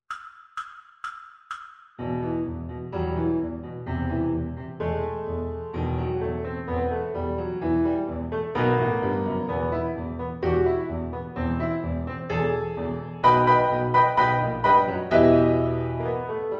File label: Piano Only; ViolinSchool